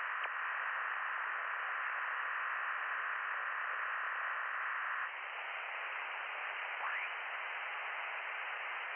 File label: from radio, 7177KHz